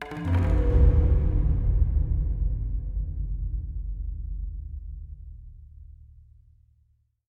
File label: perc spot